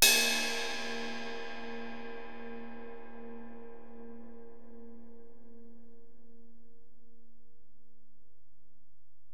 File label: Ride, Alesis DM-PRO, Drum Machines